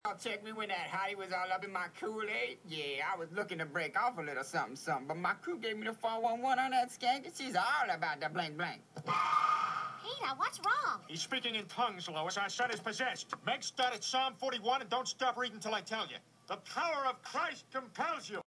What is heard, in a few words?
hehehe